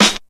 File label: 00s dry acoustic snare sound g key; snare drum samples